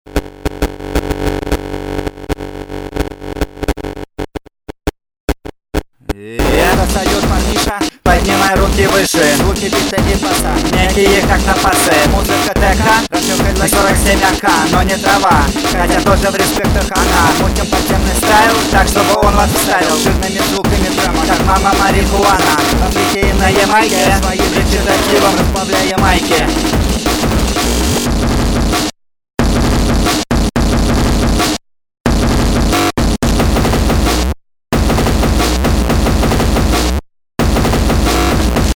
bit i bass

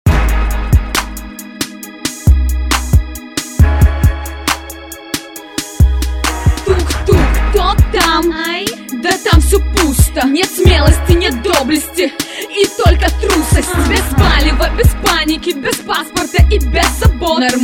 female rap battle

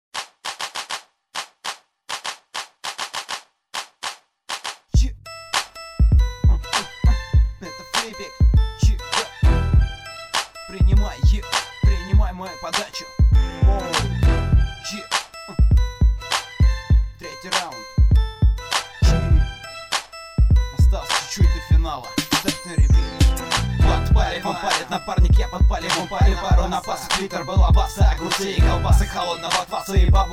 instrumental

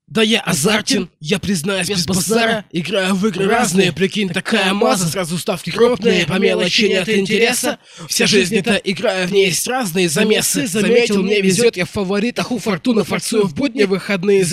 acapella; battle hip hopru 7 round 1

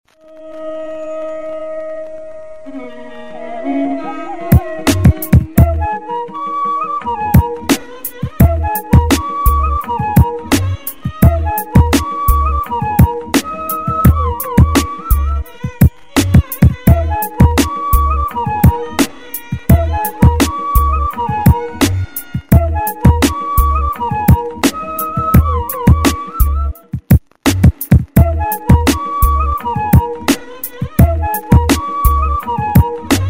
instrumental

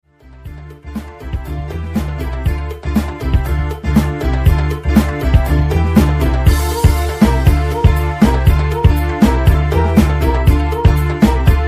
bossa nova

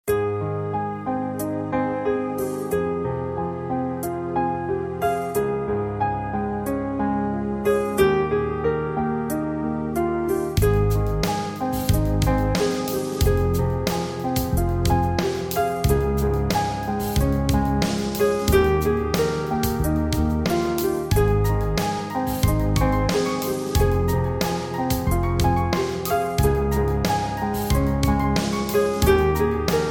good mood instrumental

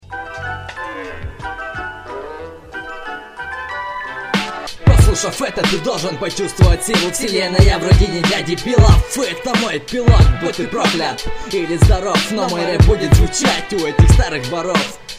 instrumental

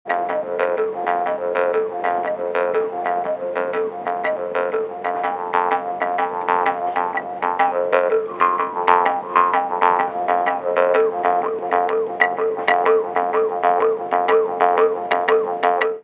bamboo jews harp

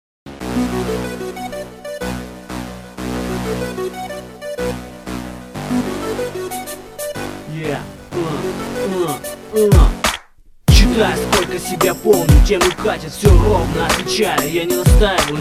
ru rap

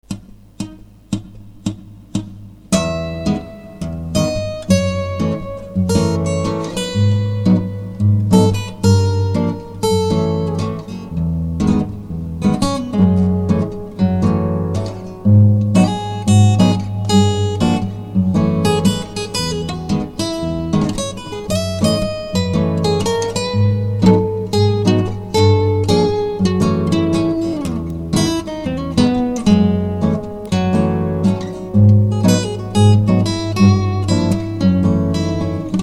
guitar improvisation

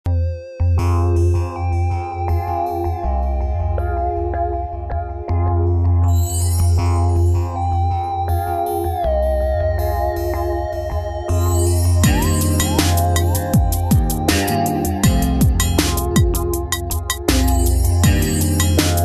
instrumental

